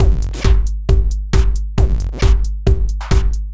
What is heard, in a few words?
Mixed Beat; Sonic Foundry (Sony Creative Software); Afterhours EDM; Session 10 - Come In Hard